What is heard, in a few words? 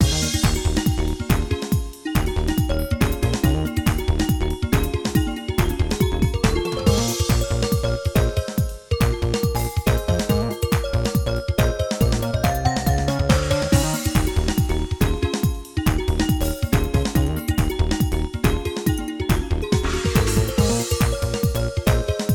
background; RS Games Sounds; Toss Up